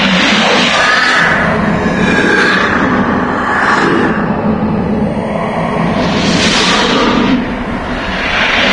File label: flight; doom3; forgottenone